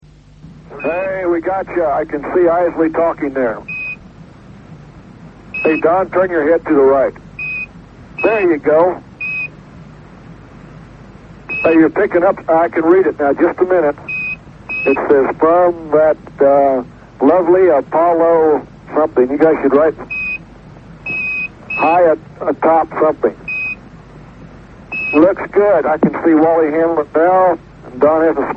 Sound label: signal from groundstation while receiving pictures; apollo-7